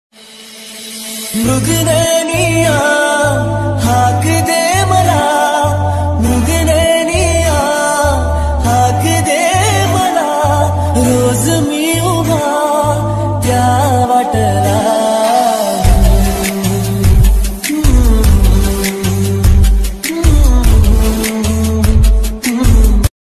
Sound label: marathi mobile ringtone